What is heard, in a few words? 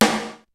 KICK SNARE; Kid Nepro; Elite; KICKS SNARES